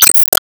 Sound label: Ball Hit